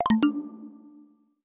Tutorial Complete

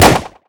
Balloon Pop; Skylanders Cloud Patrol; Arcade, iOS, Mobile

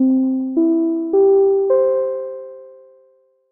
Announce Chime

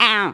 "Au"; Waterski; Neighbours From Hell Console Edition; GC, Xbox

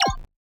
CURSOR SKILL; gamerip; PS3

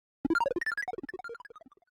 Boss BigPunch Stinger; Avie

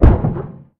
Cruiser Hit Obstacle; Lethal Company

